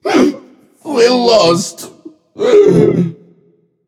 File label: boo hoo; smite